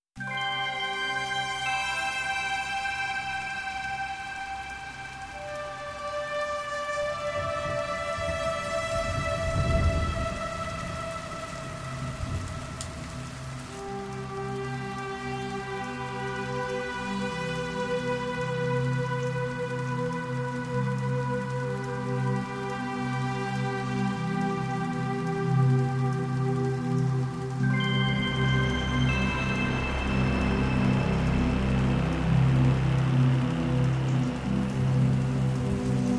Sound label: Rain Storm Cinematic Music